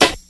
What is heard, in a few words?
prominent snare drum D key; snare drum samples